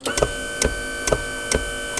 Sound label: manufacture; Earth and Beyond; EA Games